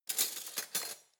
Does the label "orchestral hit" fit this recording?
No